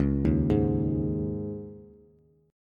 C sharp Suspended 2nd, C sharp, Bass; C#sus2; arpeggiated; JGuitar

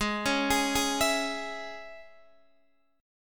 Ab Suspended 4th Sharp 5th Guitar Open D

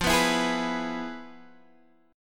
F Minor Major 7th Flat 5th Guitar DADGAD; x,8,9,9,11,9

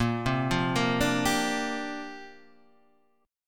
C 7th Suspended 2nd Suspended 4th Bb

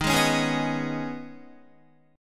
Eb 7th Suspended 4th Sharp 5th, Guitar; strummed; chordshape; JGuitar

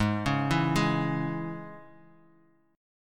Ab Suspended 4th Sharp 5th chord, Bass Standard; 16,16,14,13; arpeggiated; JGuitar

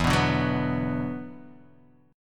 E 7th Suspended 2nd, down, Guitar, Half Step Down

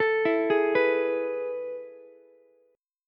A Major 7th Suspended 2nd, Ukulele; AM7sus2 arpeggiated; 14,16,16,14; JGuitar

